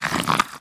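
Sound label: fox aggro, 狐狸在攻击生物时随机播放这些音效; Minecraft; 我的世界; 狐狸是一种通常生成在针叶林、巨型针叶林和积雪的针叶林生物群系的被动型夜间生物。在夜间，狐狸有时会到村庄内活动。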